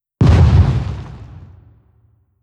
airplane bomb; Hearts of Iron IV Death or Dishonor

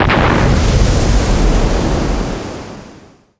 ship miss big effect; Hearts of Iron IV Death or Dishonor

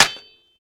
hit metal metal; Mount&Blade Warband

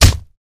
punch; Mount&Blade Warband

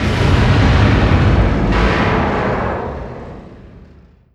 distance super heavy battleship attacked; Hearts of Iron IV Death or Dishonor

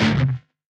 crunch; Frets on Fire

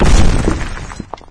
boulder impact hard, concrete; physics; Garry's Mod; hl2

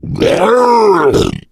male boomer spot prey, voice, attack; Left 4 Dead 2

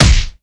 punch boxing face hit; Left 4 Dead 2

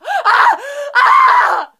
horrified, witch voice retreat; npc; Left 4 Dead 2

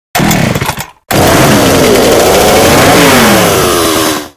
chainsaw start; weapons; Left 4 Dead 2

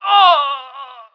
normal dying; Emperor - Battle for Dune

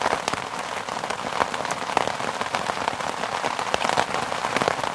rain on tarp loop; spacial loops

